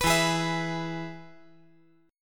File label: E-Major E